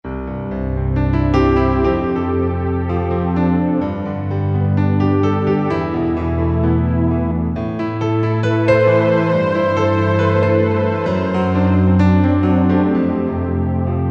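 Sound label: Piano & Strings, Korg T3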